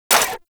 Pistol Mech; weapons; Warframe; Thanotech

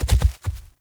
Wolf Steps Land Default; Animals

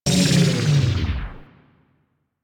Ring Rotate Large Slow Stop; Ambience; Interior; Warframe; Orokin

